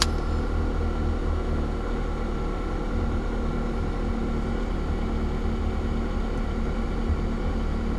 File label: cars